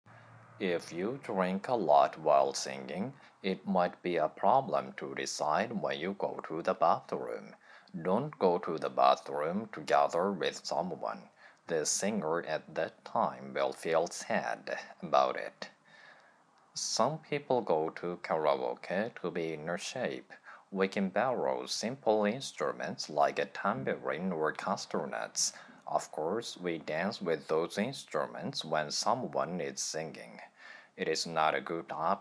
karaoke

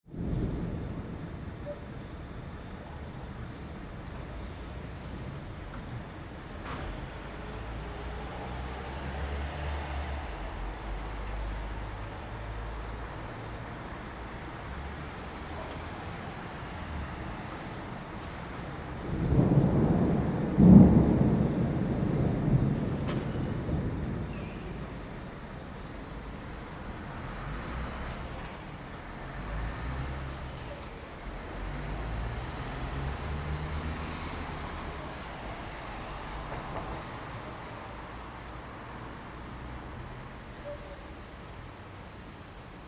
rainstorm in palermo italy

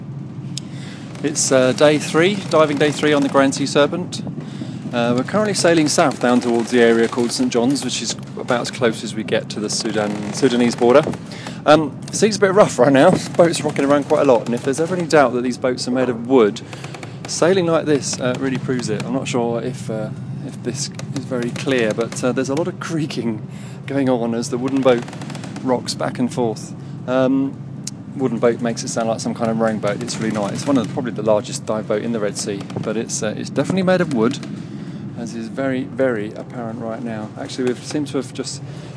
sailing creak creak creak; sailing south

